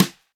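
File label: snare drum SLAP; Snare Drum「Slap S1」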